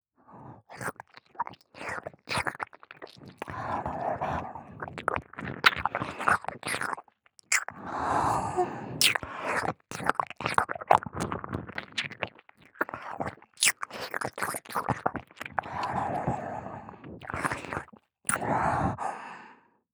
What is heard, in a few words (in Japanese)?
耳舐め, 姉